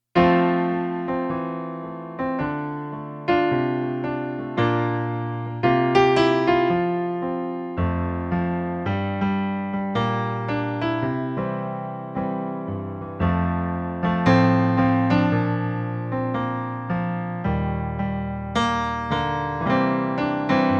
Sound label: piano